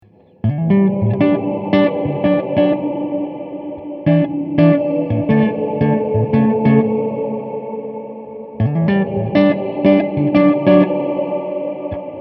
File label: Chorale